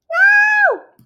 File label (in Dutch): miauw